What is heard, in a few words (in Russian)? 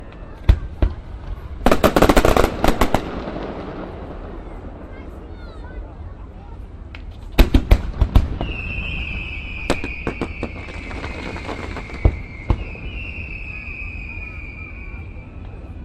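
3d saliut, fireworks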